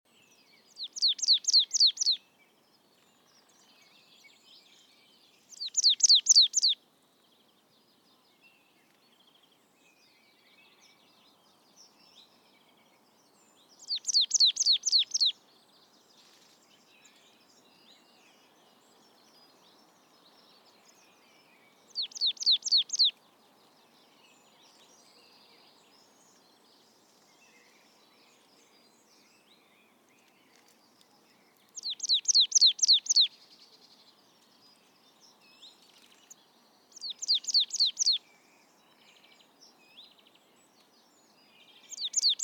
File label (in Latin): Poecile palustris; SETE